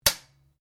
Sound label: RIFLE CZ 452 VARMINT 22 CALIBRE DRY FIRE; A selection of domesttic firearms; Rifles; Guns